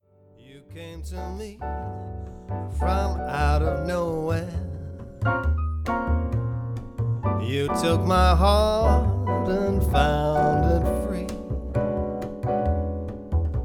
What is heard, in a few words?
piano trio